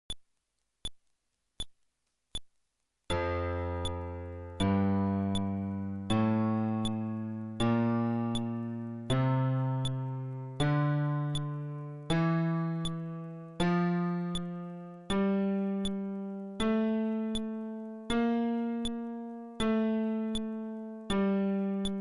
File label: Scale テンポ80, fretless bass